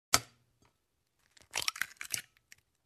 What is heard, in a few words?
Pulling off yoghurt lid